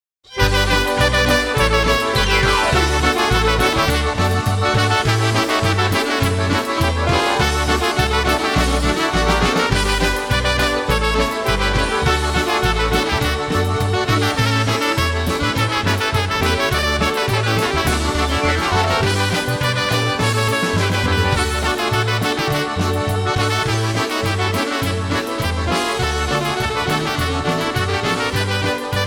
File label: Polka